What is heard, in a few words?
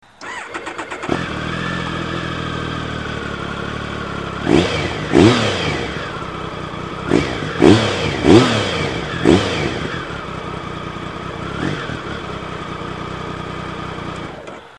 racing